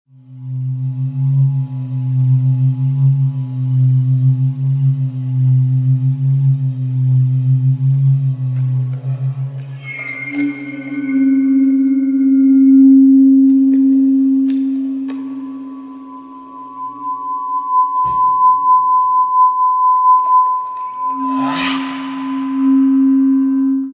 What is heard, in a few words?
feedback